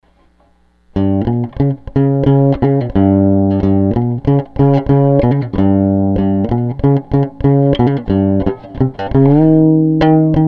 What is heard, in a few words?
bass